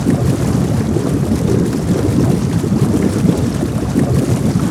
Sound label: beast vocal angry growl